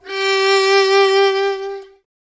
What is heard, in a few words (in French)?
violon sinola